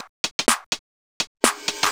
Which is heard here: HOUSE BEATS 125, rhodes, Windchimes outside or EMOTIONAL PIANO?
HOUSE BEATS 125